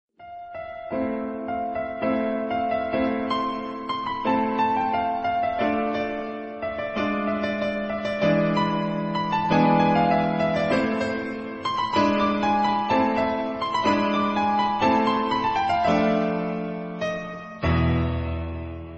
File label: lg ringtone